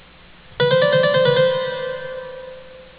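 Doppelt-Cadence und Mordant (the above combined with a mordent; Palmer: "ascending trill with termination")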